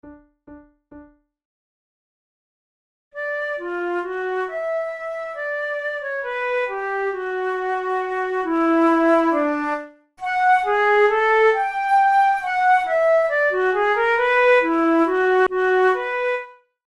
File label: duos for two flutes finale; romberg